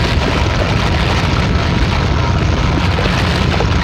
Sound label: blood laser; forsaken